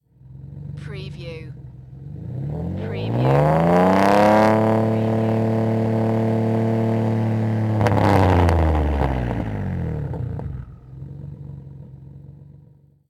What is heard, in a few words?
NISSAN 350Z EXHAUST LONG REV